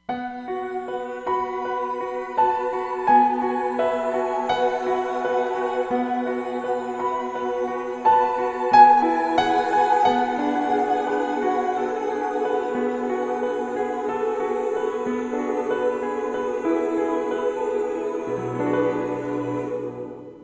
Cicada Piano, Roland Fantom XR

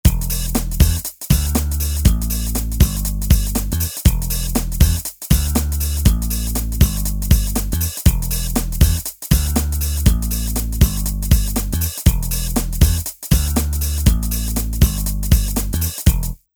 Rap Hip Hop

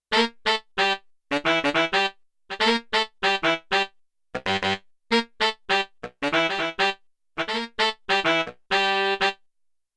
Riff, Big Band; Yamaha TX16W; Big Band Performance

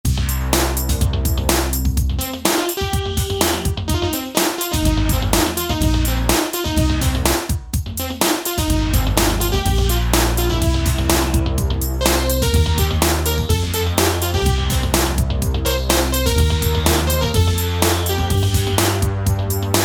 Heavy Nail, synth

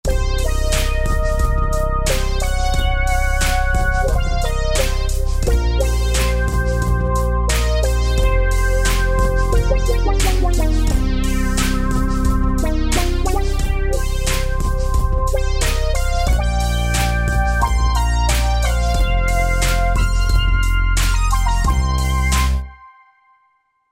89, Hip Hop; SnakySplt; Roland SR-JV80-12 Hip Hop Collection

